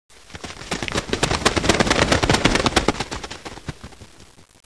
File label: midi